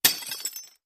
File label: Glass Breaks 2